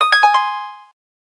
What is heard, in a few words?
harp; keytone; SKY; IM-A800S VEGA LTE